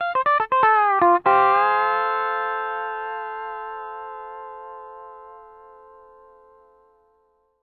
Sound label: L, Whole Lotta Country, ENDING120; PD ENDING120